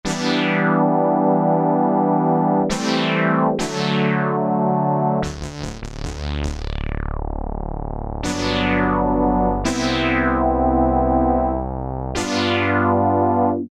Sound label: Reso Synthe, Korg Poly-800